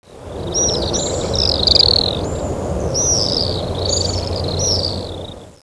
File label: Apus pacificus; Call; 細碎呢喃且連續的聲音，偶而混雜而響亮「Skre-Hehehehe」的高音; Teruo Furushima, Wild Bird Songs in Japan, 日本